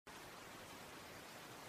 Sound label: autumn Leaves